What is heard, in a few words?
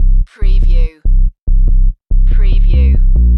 Techno-Trance Sub Bass Loop in C 142 BPM